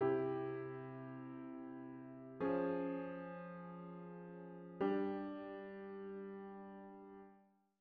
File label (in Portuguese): Estudo de Harmonia acorde de sexta napolitana